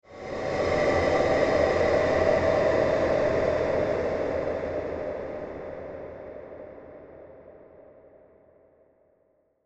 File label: Sci Fi Spaceship; Sci Fi Spaceship Fly By; Spacecrafts